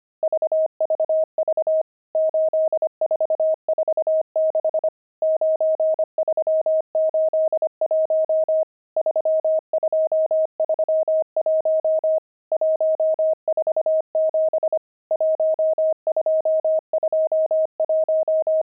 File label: pangram, intermediate pangrams files